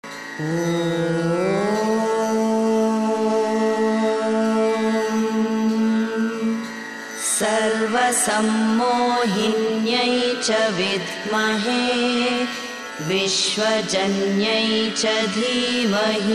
shakti gayatri female voice

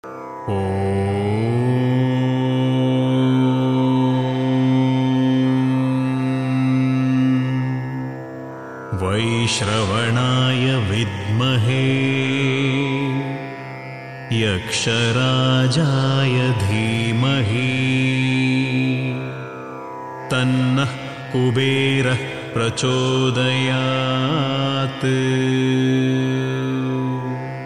kubera gayatri

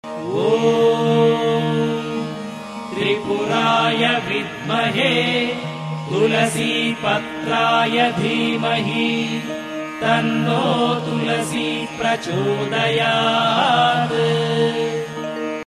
tulasi gayatri male voice

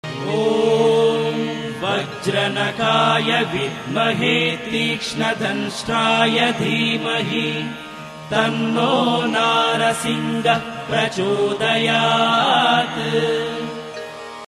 narasimha gayatri male voice

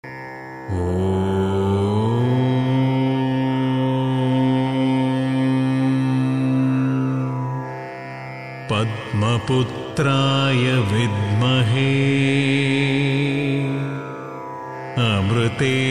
ketu gayatri

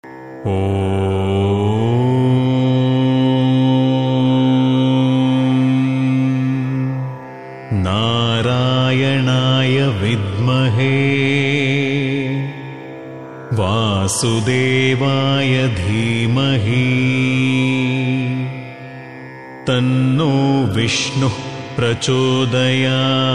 vishnu (narayana) gayatri male

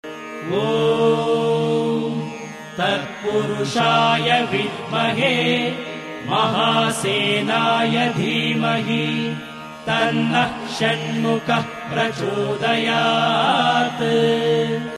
subramanya shanmukha gayatri

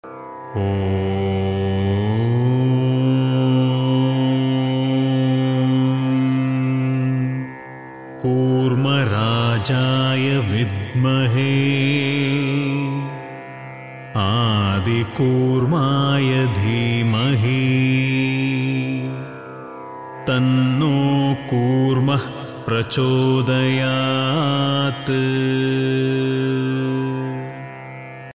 kurma gayatri

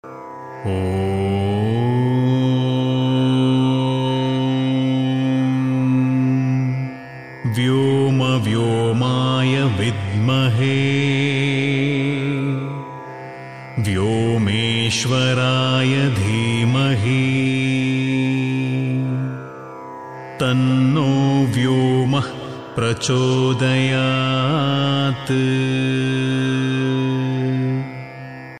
vyumma gayatri

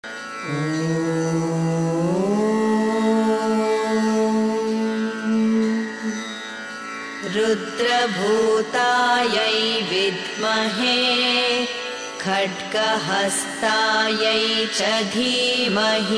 chamunda gayatri

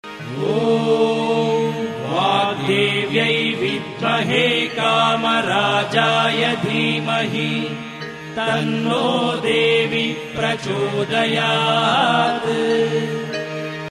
saraswati gayatri male voice

